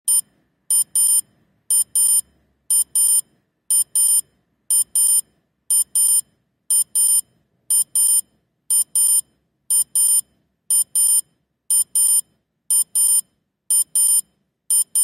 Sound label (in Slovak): ukazka zvuku alarmu